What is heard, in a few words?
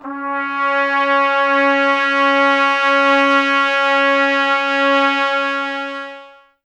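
brass trumpets C; 90 s Sample CDs; Miroslav Vitous; Woodwind Brass Ensemble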